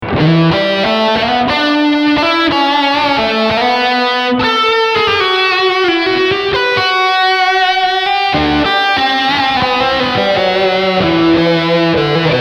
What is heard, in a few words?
Spring Reverb